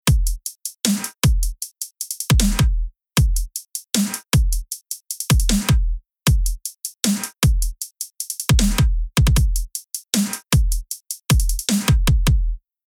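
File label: Future Bass Rhythm snare drum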